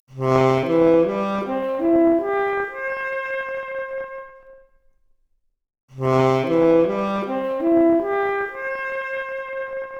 Saxophone arp, Stereo output noised; 3bit

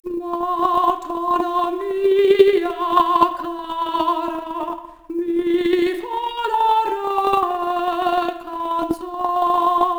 Soprano Stereo output noised, 3bit

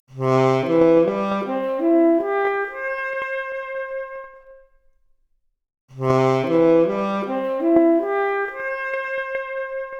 Saxophone arp, mp Stereo output noised, 4bit

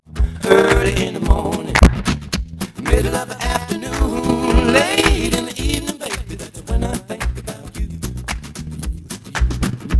noised; 3bit

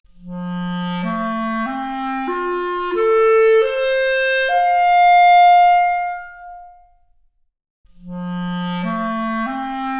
Clarinet arp, Stereo output noised; 3bit

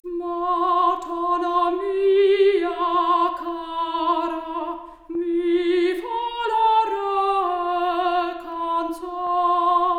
Soprano Stereo output noised; 4bit

